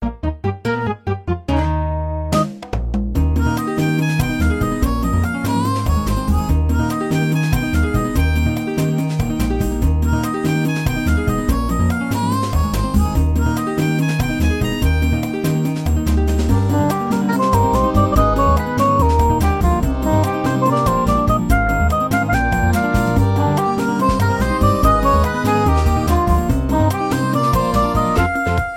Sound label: Maple Treeway Theme